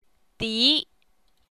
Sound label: tone